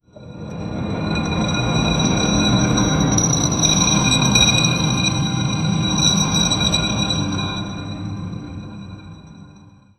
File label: a soundscape of space, science fiction